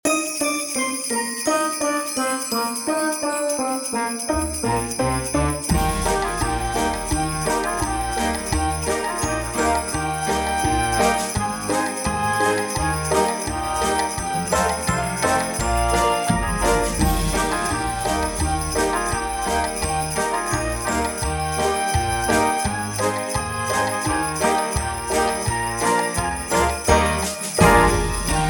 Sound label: jesus jingle; CHILDREN'S CHRISTMAS PROGRAM